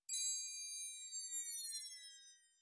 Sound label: message, doppler shifted ringtones